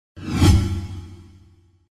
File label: Sci-fi transition fast whoosh